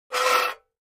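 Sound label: Metal Scrape On Cement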